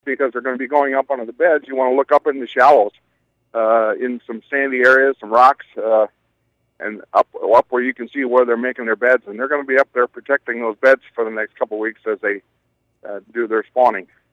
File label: bass opener